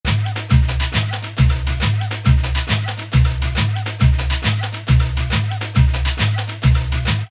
Samba; Voices

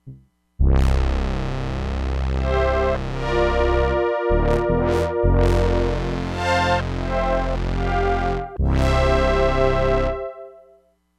BRASS - STRINGS, Crumar Bit 99; Factory Programs

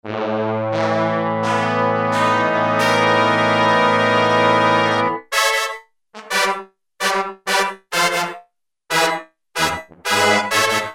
BrassSection, Roland U-220; Factory Patches

